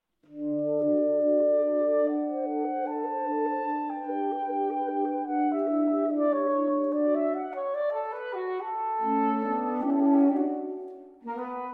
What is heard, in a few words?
String Quartet in D Major (Arr. for Saxophone Quartet) I. Allegretto